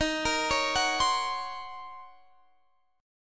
D sharp Minor 13th D sharp Guitar Half Step Down, chord